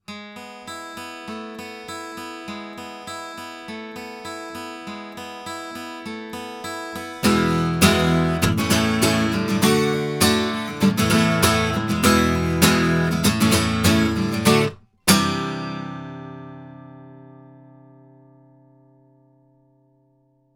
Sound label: Hansen HCM-101 guitar Gibson, microphones recordings